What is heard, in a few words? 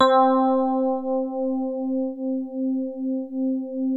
DX7 mid C4, e-piano; Music Store